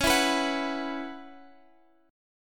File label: Db Minor 11th Db down Guitar Open F; strummed; Dbm11